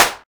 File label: clap sound b key